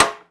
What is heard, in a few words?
Aircrafts MiG-15bis Ratchet; DCS